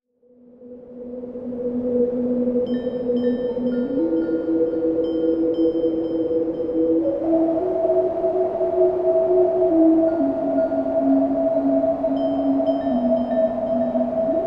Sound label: ice chimes